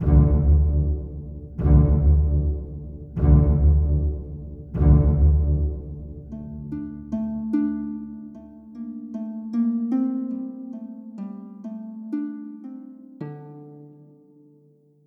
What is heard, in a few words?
game over, chiptunes